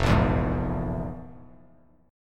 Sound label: F Minor Major 7th Double Flat 5th; FmM7bb5 strummed; JGuitar